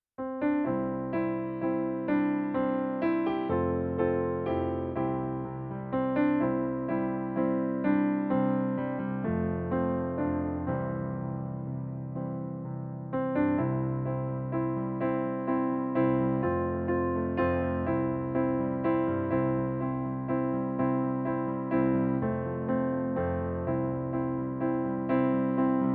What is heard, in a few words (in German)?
Instrumental, C-Dur; Unser Vater; Modernes Vater Unser